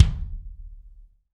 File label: BD AMBIENT, Real Mega Drums; Best Service